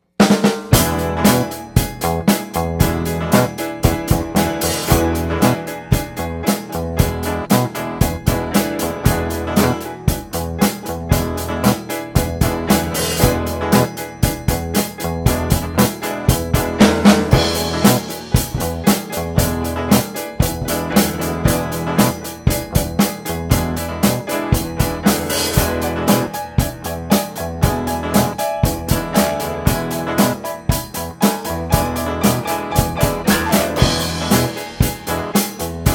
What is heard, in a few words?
E Chord Jam